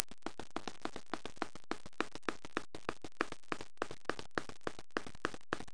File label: sleep kiss tiptoe; Greatest Stories; slbeauty